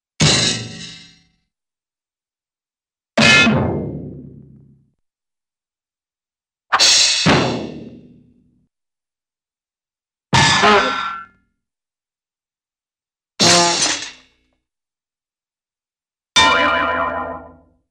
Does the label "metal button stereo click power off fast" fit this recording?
No